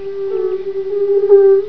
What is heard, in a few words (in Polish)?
bombina, plazy